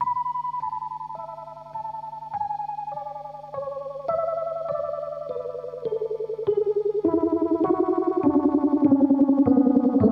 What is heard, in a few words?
rhodes rotovibe